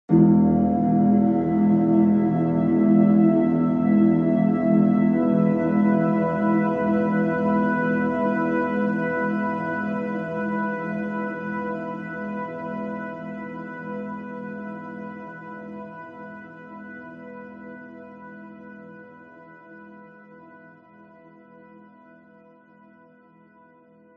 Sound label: horror hit long clunk dark sinister ominous musical 2 notes